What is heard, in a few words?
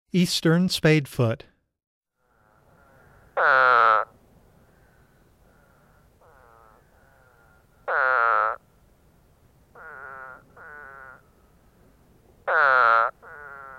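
Eastern Spadefoot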